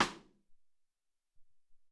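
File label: Ambient Stick 1, Alesis SR-18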